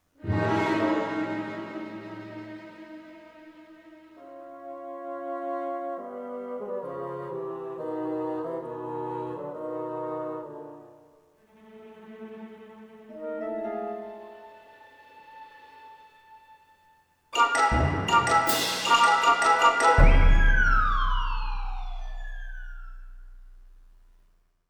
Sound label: Dom b5 trill stab + Bassoons Goin Down! + Dainty Contraposition Suspense + Tritone Xylo; Mickey-Mousing Devices